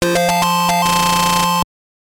Jingle; Doraemon no Study Boy Gakushuu Kanji Game

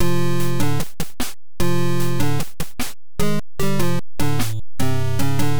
Bonus Game Lost; Freedom Force; NES

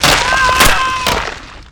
Smash Man Crush Bones Break; Lucius III

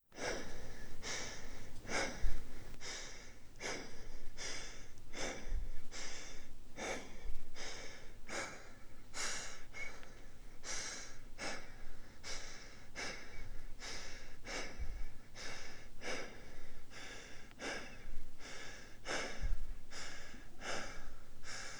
riding bicycle breathing; the 39 steps; Foley

